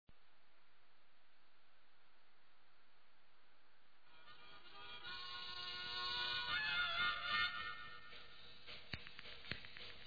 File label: HARMONICA SOLO